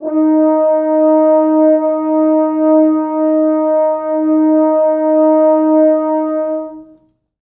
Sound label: 4 FrHorns; East West; Quantum Leap Horns Sax; 4 FrHorns MOD